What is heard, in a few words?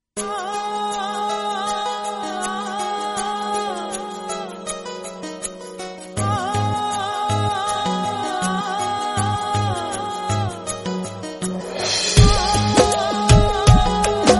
INSTRUMENTAL RINGTONE; KRISH